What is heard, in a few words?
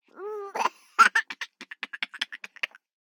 BABIES LAUGH Boy 12 months; Baby Boom